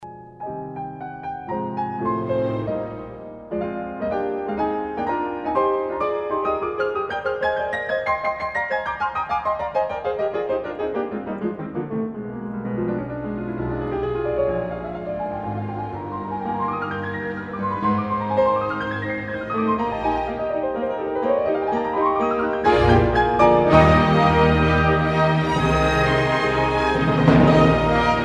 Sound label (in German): Beethoven 5. Klavierkonzert